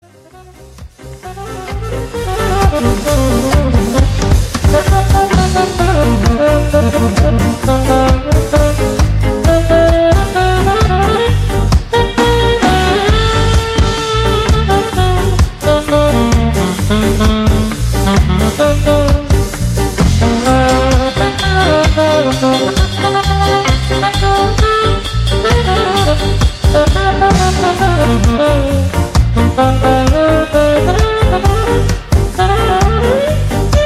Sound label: Sax